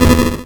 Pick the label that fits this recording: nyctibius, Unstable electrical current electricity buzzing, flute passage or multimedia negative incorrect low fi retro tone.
multimedia negative incorrect low fi retro tone